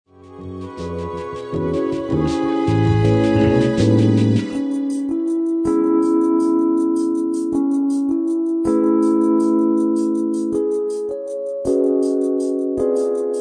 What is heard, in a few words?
smooth jazz cafe